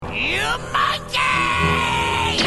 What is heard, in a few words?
monkey